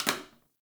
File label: Janitor Plastic Spray Bottle; Ambience; Gameplay; Warframe; Nineteen Ninety Nine